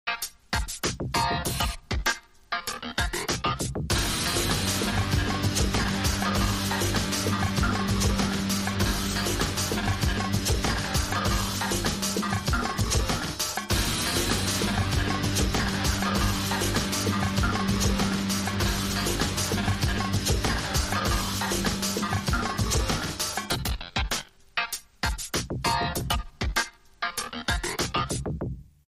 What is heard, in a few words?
Turntable